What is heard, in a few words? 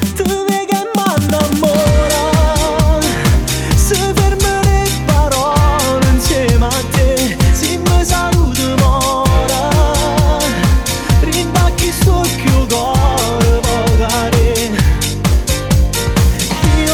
remix napoli neomelodici disco music